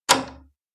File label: Door key lock; Phasmophobia